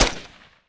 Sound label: mp5 fire; firearms